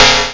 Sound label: METALIC; BLOOGV1 00